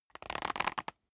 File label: leather creak short soft